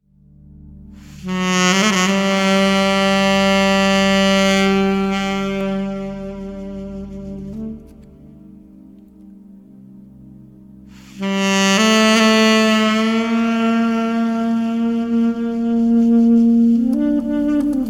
Scooter Sax; Little Zizou (2008)

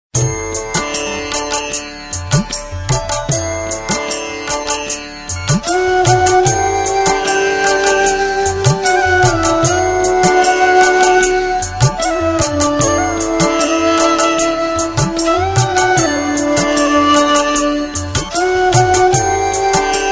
Hare Krishna Super Flute

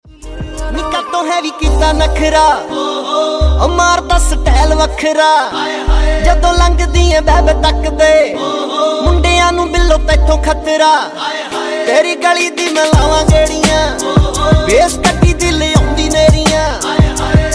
Punjabi Ringtone

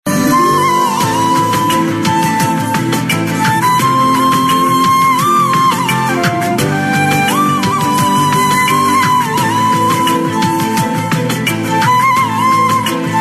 Flute BG Tune; Tv Serials; Sony; Main Na Bhoolungi

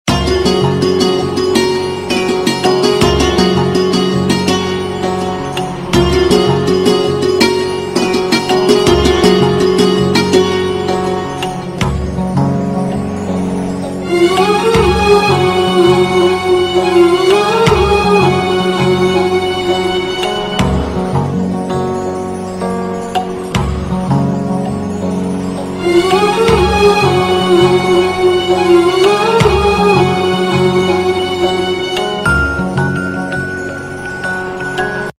Instrumental Tune; Mann Kee Awaaz Pratigya

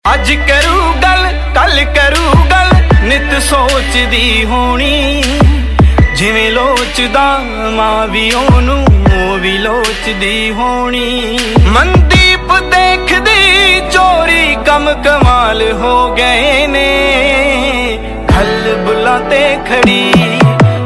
Ringtone, Punjabi

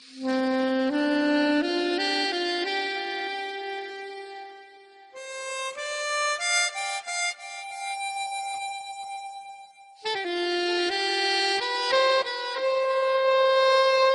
Flute; Republic Day

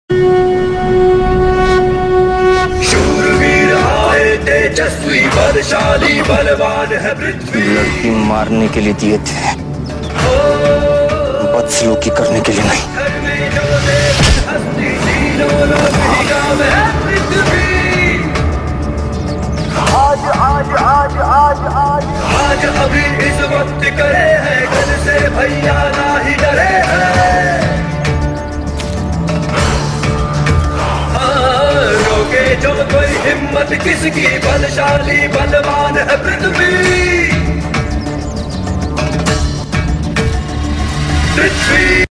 Song; Prithvis; Tv Serials; Life OK; Junoon Aisi Nafrat Toh Kaisa Ishq

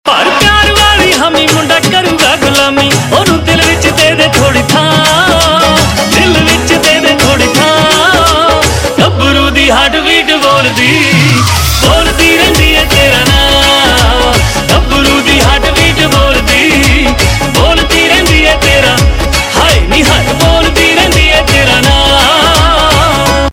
Heartbeat Ringtone; Punjabi

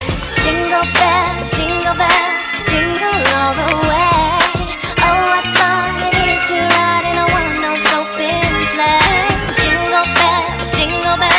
Jingle Bell

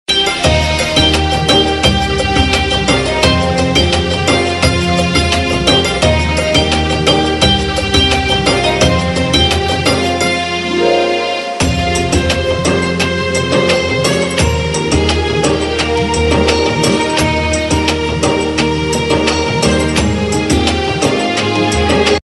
Background Tune; Zee TV; Jodha Akbar; Salim Anarkali

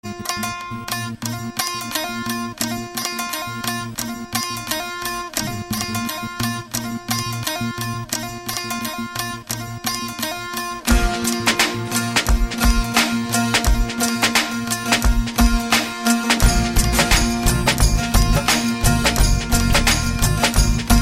Boys Music

